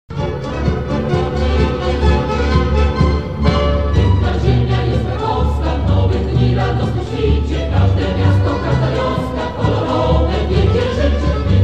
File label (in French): Krakowiak, Pologne